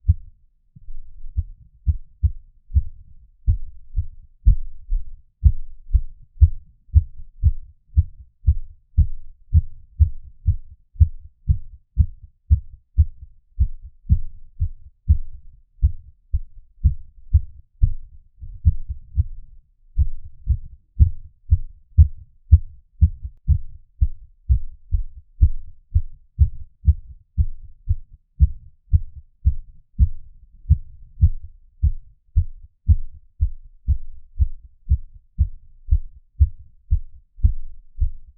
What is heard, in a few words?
heartbeating, anger; hulk out